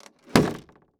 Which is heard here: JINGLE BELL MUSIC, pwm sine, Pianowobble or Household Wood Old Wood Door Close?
Household Wood Old Wood Door Close